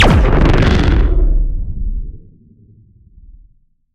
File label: Shot Large Blaster Proton Burner